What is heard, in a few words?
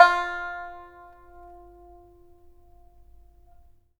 SNXUAN F5 MF, Heart of Asia; Spectrasonics